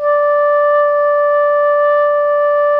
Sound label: SOP PP D 4, Saxophones; Greytsounds; Philip Wolfe David Crozier's Saxophones